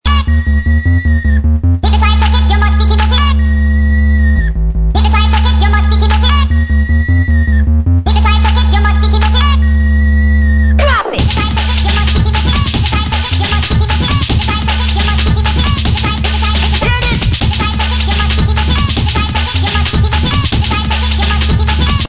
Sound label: bass